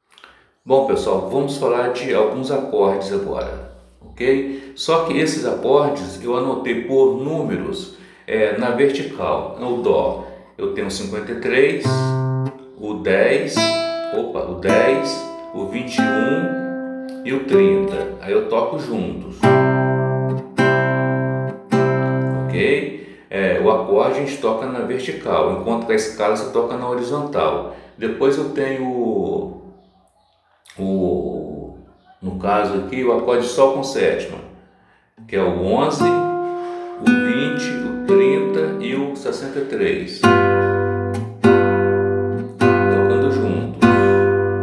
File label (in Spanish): acordes